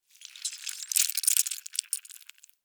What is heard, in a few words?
Larva Maggot Move, Larva, Maggot, Movement, Slimy, Insect, Bug; Larvae; Blastwave; Animals:Insects